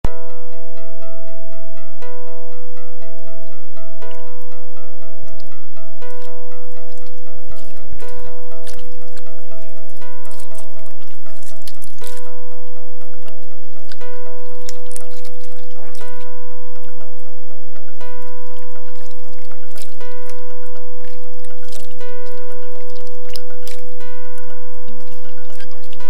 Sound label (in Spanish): paisaje sonoro; San Luis